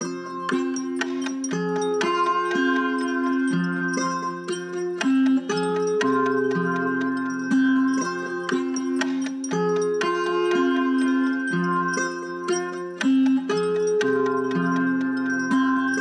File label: 120 BPM F Min Guitar; Void